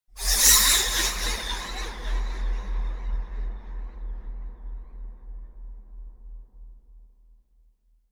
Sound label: Whoosh Sharp Echo - Transition; Hollywood Edge; sound designer's toolkit v2